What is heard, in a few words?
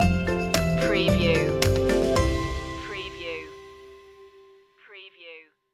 laugh and play stinger